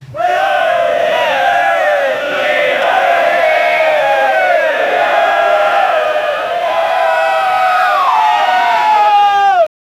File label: a crowd of like chanting